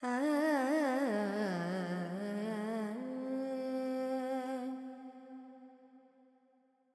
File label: FX Purvi Kalyani, Carnatic Female Alaps; Sample Banks